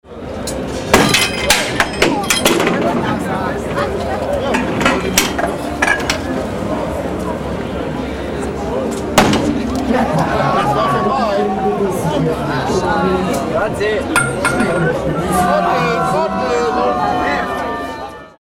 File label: octoberfest try your strength machine